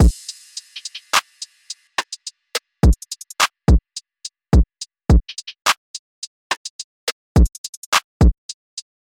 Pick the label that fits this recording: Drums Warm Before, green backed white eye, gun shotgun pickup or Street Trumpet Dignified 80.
Drums Warm Before